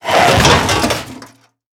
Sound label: Hose Break; car simulator 2